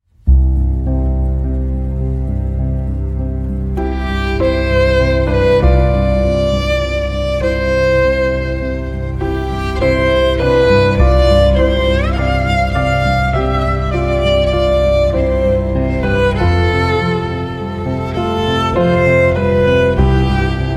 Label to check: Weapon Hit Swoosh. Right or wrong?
Wrong